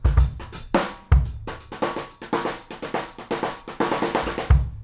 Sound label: FILL